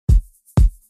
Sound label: Afro House Mix